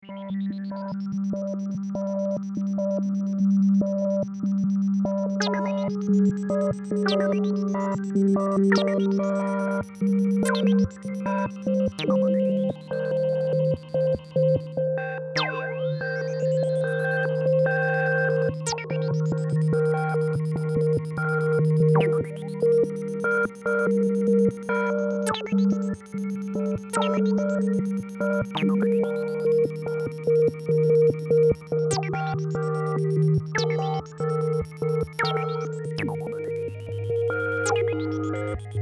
LFO reso Pad

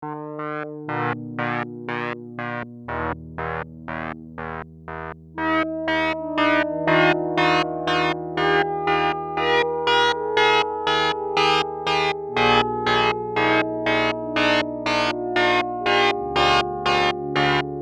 ramped LFP Pad

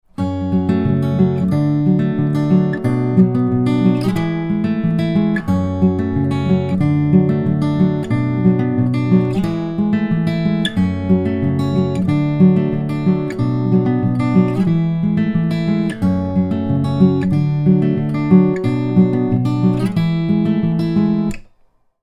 ibanez aam700ce nt finger picking miced

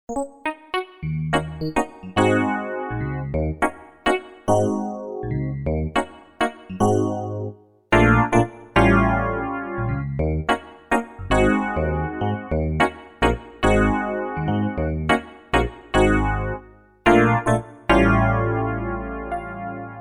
EPiano

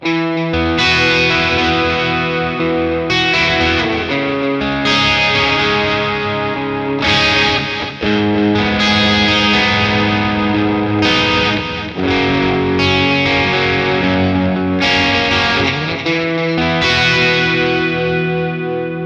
crunch Variation modulated Delay Spring Reverb; Boss Katana Artist

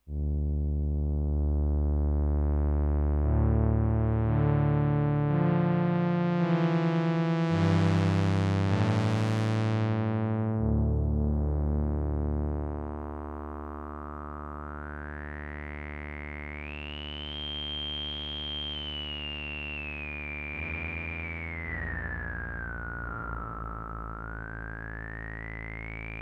Moog Muse Filter Resonance

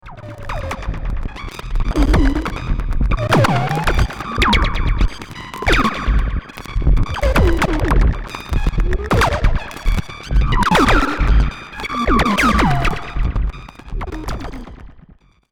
Motor Synth MkII Random FX; Gamechanger Audio

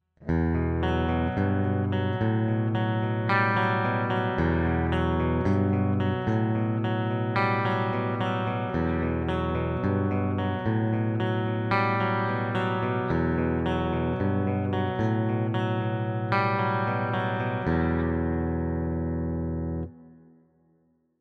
clean Prog Arps; MGK Signature

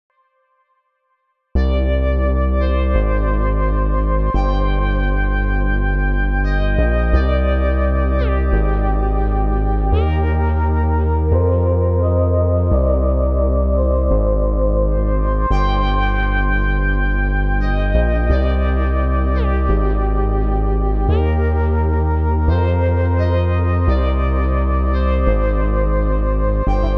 Elektron Digitone Keys

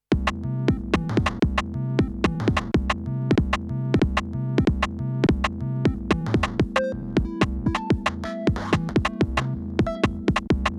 Korg Kaoss Replay Slices Hot Cues

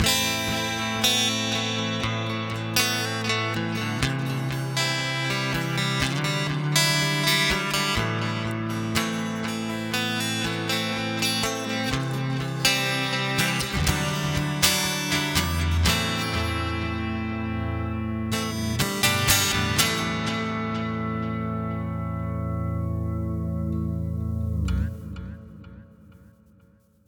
Blackstar St. James Software Acoustic Ambient

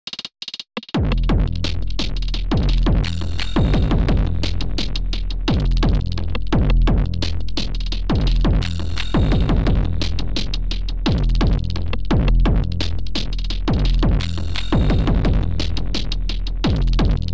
DirtyDelay Dirty; Metric Halo v4; Drumazone2

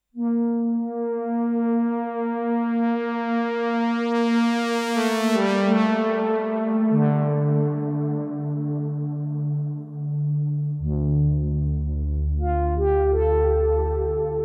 Moog Muse Polysynth

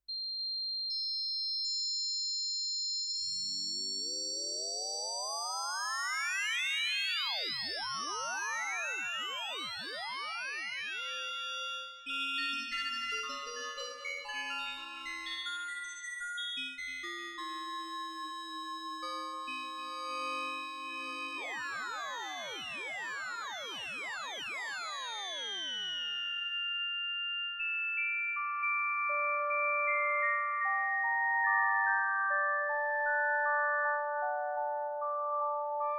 Crossmod Extreme; UDO

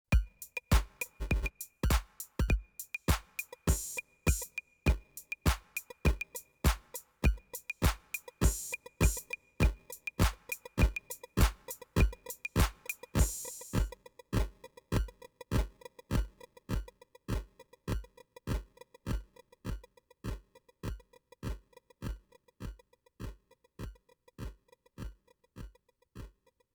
Coarse Feedback; Bastl

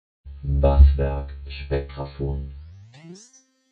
spectraphon ableton spectral reso; Make Noise

